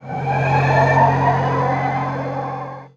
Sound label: Power On Light